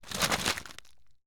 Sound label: fast rise, gritty, release; Vaeyan I; Aerorated Iced Tea